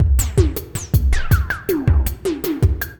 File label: DRUM LOOP ambient 160